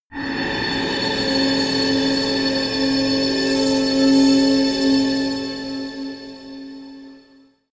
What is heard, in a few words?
sci-fi predator dark shuttle, drones